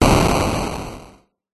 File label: retro game classic explosion 8 bit arcade 80s weapon attack